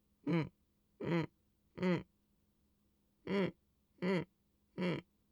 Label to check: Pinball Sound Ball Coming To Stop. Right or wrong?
Wrong